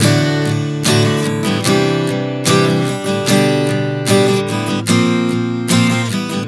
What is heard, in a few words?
Progression 074 D-Cadd9-G-Bbadd9, Acoustic Guitar, Chord Progressions 074 bpm; Sonic Foundry; Songwriter Acoustic Guitar Companion